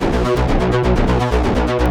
B1, SynthLine; 90 s Sample CDs; NiceBeats; SynthLine VOL-20

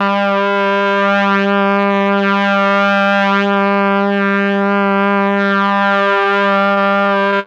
MEL BRASS; ILIO; Propeller Island; Legendary M400

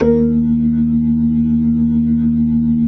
B-3, Jazz

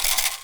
PU IL, HAWAII PERC

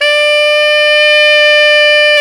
SAX TENOR; Tenor V-sw

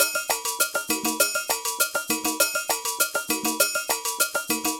Ensemble, Latin Percussion

